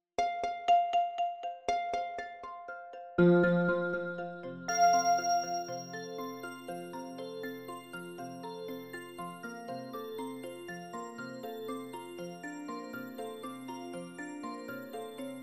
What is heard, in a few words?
live music piano